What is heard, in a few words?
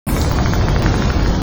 degu